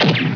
saber; Dynamite

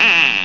kick bomb; Dynamite

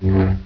saber loop; Dynamite

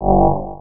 flame; Dynamite; shorter flame

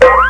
Boiee; Beeps; Boings; m68k-amiga-OS

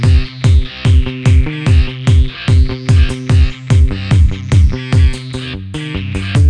music game; chromium-bsu

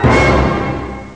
Orch Heavy, Beeps, Musical; m68k-amiga-OS

